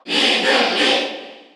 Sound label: Cheer Korean; Ridley; SSBU